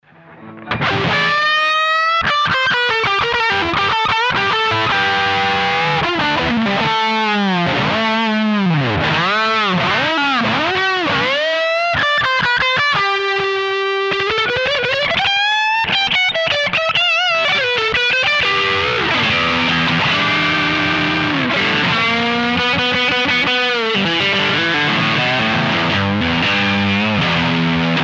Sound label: Overdrive Channel plus Boost Vib; OTS